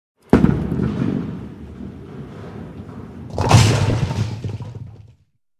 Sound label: Bowling ball striking pins